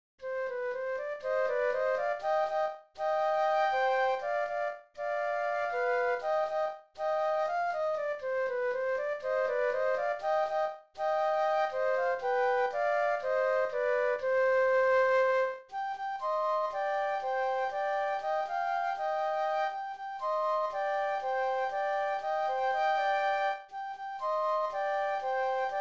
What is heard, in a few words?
Flute Duet